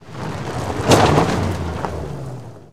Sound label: Auto Tires Stop Gravel; Automobiles